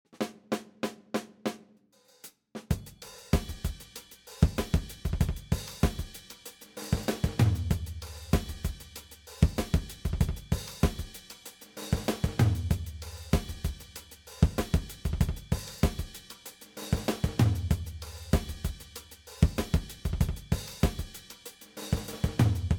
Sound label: 70s Tight Full Funk